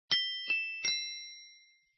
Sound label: Respiration